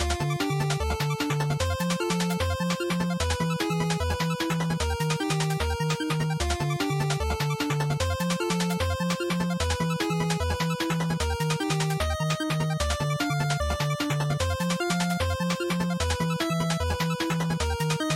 NES Shooter Boss Loop150

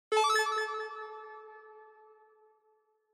Multi Accent Low

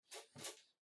Portable Stereo Far Turn On

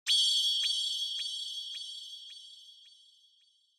Onoma Flash High Delay

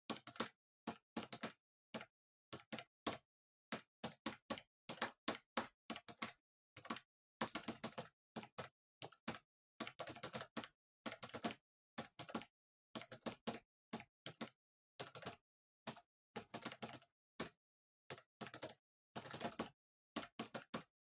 PC Keyboard Far Mid

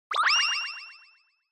Motion Pop

